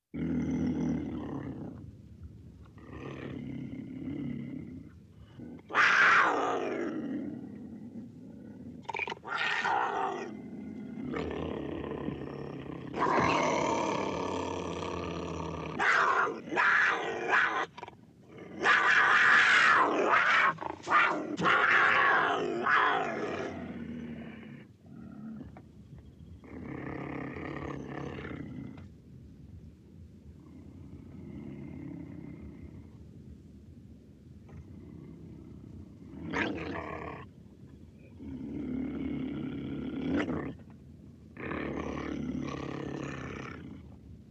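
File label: Cougar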